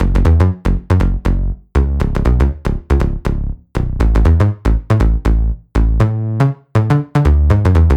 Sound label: Synthwave Synth Pop